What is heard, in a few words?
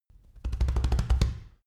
monkey chest thumping